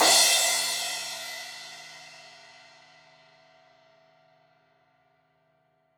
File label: Crash 16in edge, Cymbals; Stereo; Deeply Sampled Drums